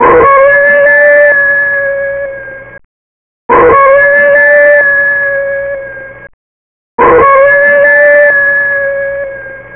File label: Wolf